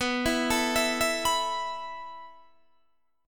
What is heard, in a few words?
B 7th Suspended 4th B down Guitar DADGAD